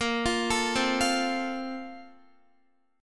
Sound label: Bb Major 7th Suspended 2nd, Guitar